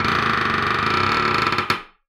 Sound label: Door Creak